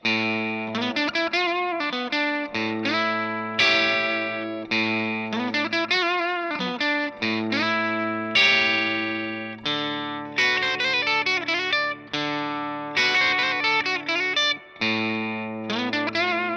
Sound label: G&L ASAT Classic Bluesboy Semihollow; 2tone Sunburst